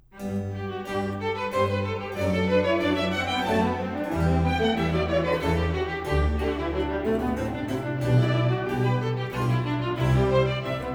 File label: Variation 12 canone alla Quarta, strings; Bach; Goldberg Variations; Sitkovetsky; NES Orch